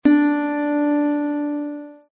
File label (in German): Zithermacher Ton 8 LAUTER